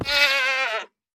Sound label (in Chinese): 山羊死亡声